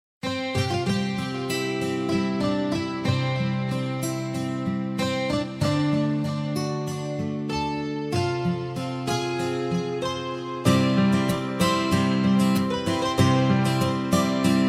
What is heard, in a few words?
wind and rain